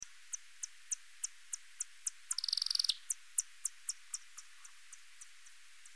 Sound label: Kerivoula papuensis